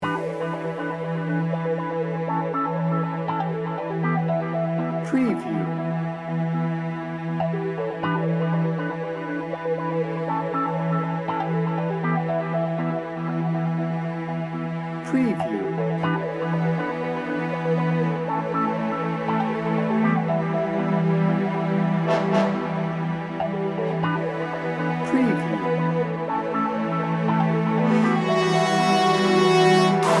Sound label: global adventure bed no drums